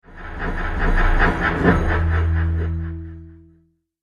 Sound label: Strange Train Trailer Hit; Trailer Sound Effects